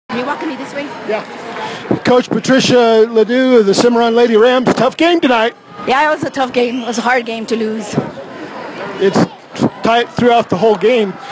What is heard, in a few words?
Cowbell; Cimarron Lady Rams Champs